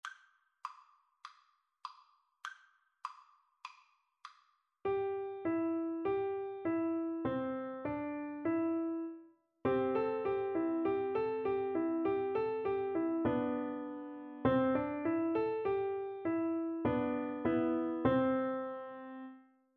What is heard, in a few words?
piano duet